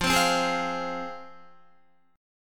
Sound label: F Minor F down Guitar; strummed; JGuitar